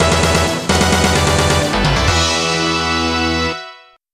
Stage Clear; Contra - ReBirth

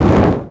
ROCK

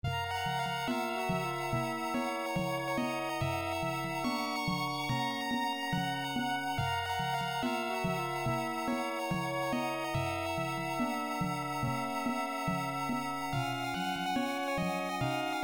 underwater

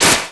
ROCK

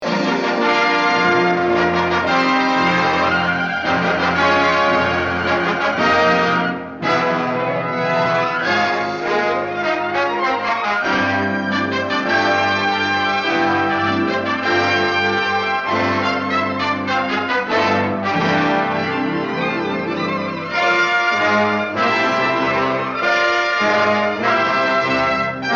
Fanfare; Arizona Fanfare; Ren & Stimpy - Production Music vol. 3

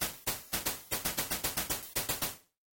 Round Start; Insector X; Genesis

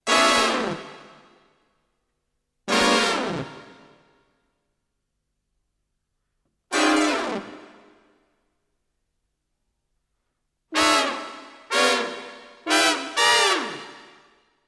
Bat Stabs; Ren & Stimpy

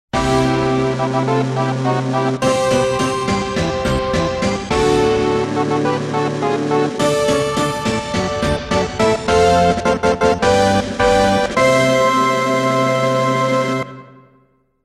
fanfare; N64; Bomberman 64

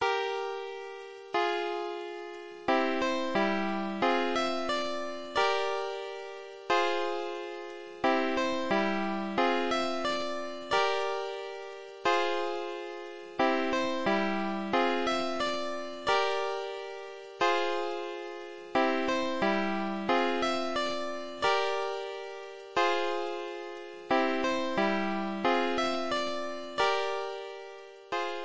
Continue; Quack Shot; Genesis

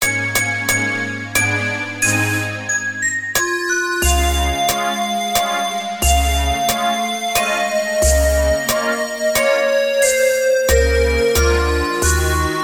Waltz; Chiptune; Gamecube; Paper Mario The Thousand Year Door

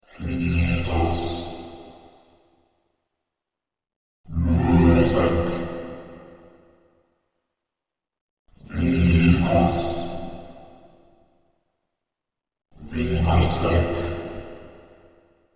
Bass Chant; Soundtrack; Final Fantasy 8

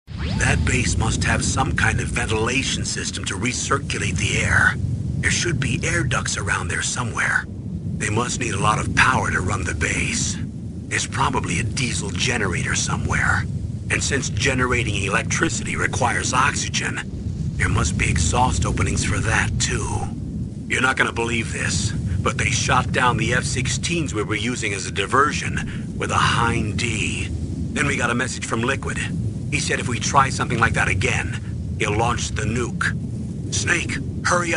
ventilation system; MGS Samples

